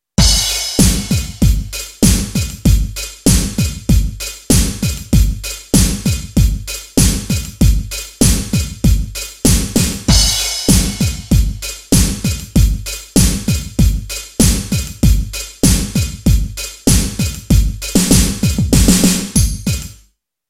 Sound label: Snare